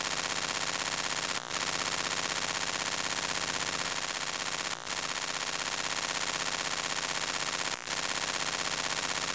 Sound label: Electromagnetic Playstation Kit; Mono; Essentials Series NOX SOUND